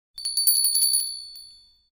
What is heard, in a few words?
bells